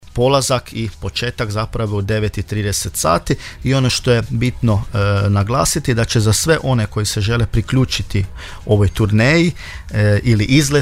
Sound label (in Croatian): orgulje